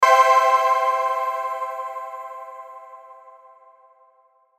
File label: Hit Burst Vibrato Chimes; Production Element Imaging Element Accent Transition